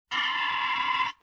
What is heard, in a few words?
LoFi Synthetic Bird Caw